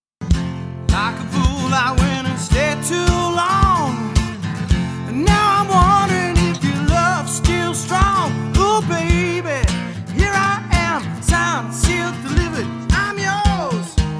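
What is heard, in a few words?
Solo Acoustic, Singer Guitar Player; Guitar Vocal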